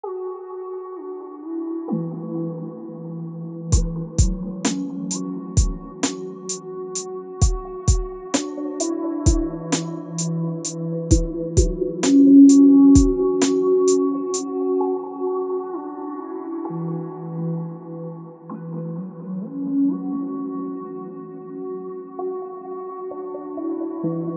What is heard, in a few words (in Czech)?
melodii beat; k vasemu zpevu